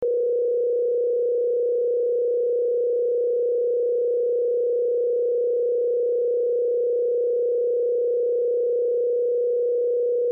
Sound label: classical